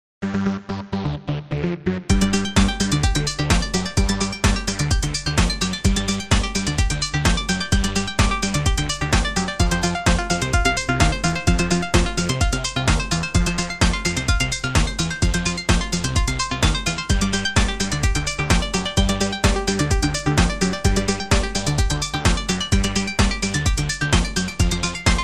lofi; Journal Intense Flame; stream

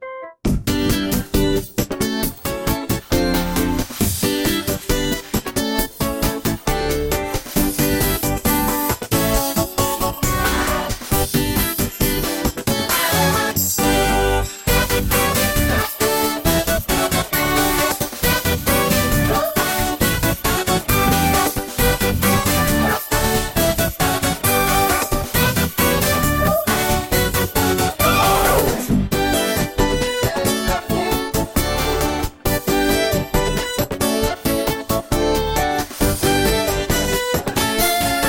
a happy fun jingle, super joyous; for agameaweek; play the games

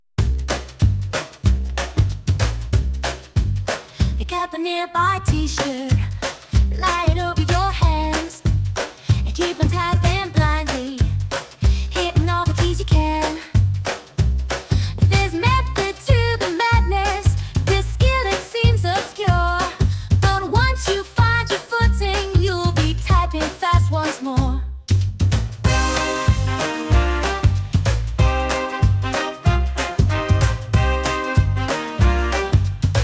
Precarious Boom Swing

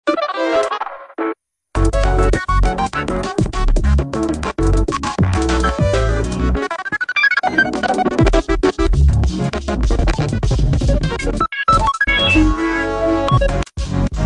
8bit frisbee championships; AI Test Kitchen

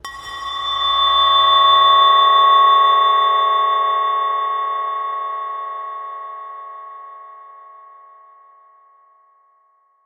Ding

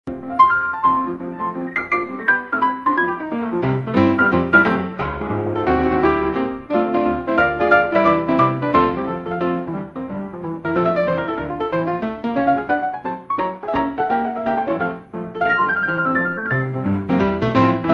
oscar winning soundtrack for a strutting chic; AI Test Kitchen

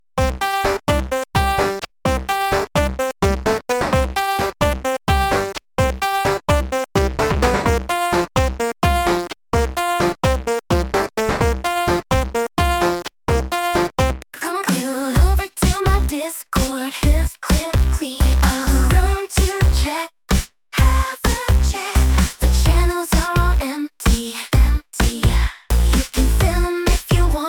Disco

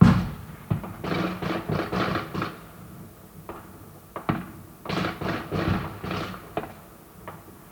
Fireworks

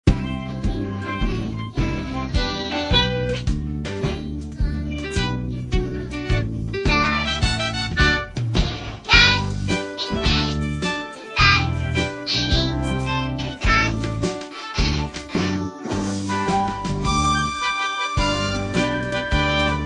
nursery rhyme happy frog hopping in the gar; AI Test Kitchen

